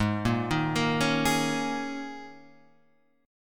Ab Suspended 2nd Suspended 4th Ab, Guitar Half Step Down